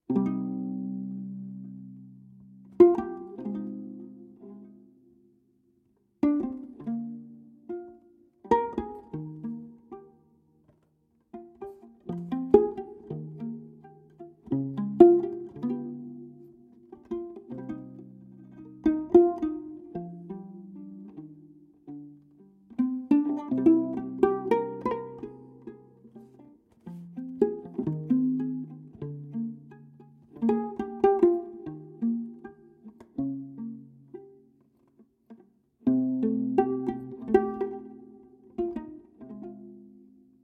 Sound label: Viola